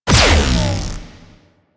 Crp Space Fighter Support Drone Shield Destroy

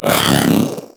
Zanuka Companion Vocal Attack Melee; Pets; Warframe

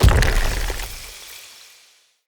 Fey Ward Healing Totem Impact; Powers

